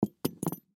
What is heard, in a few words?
Belt Leather Set, Belt Leather Set Down; Set Downs (Sound Effects:Objects); Foley; Blastwave